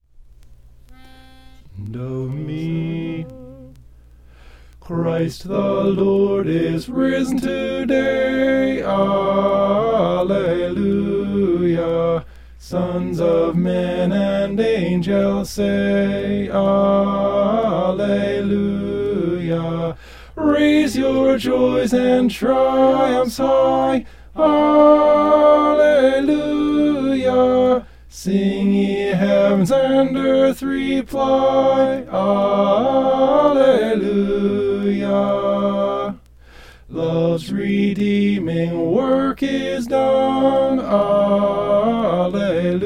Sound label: tenor; christ the lord is risen today